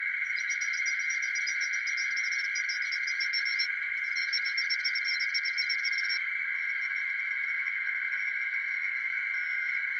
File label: Deep Jungle Night Ambience; forest jungle ambience